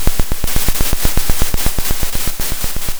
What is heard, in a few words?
Sound of a drum roll